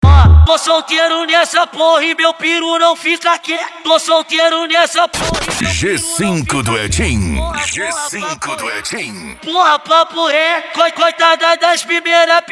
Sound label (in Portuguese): mega funk e funk mega bass